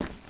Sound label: step; Undercroft